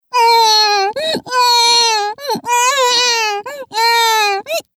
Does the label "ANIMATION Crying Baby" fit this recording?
Yes